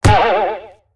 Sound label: Skill Hit Melee Strike; Angry Birds Epic